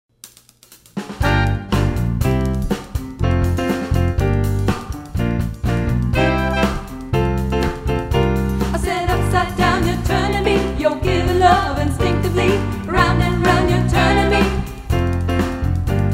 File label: vocal